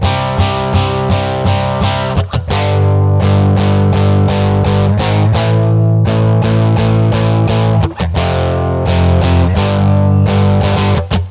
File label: scratch slomo